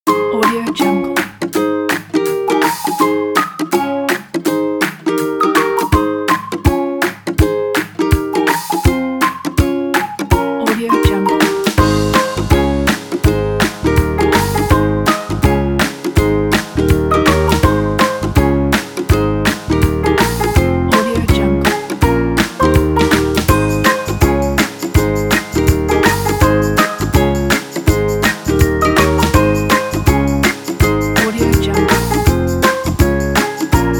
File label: cooking happy